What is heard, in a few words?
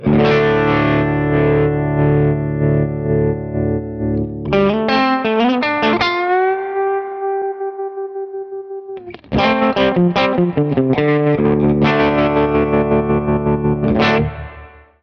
TAP TEMPO TREMOLO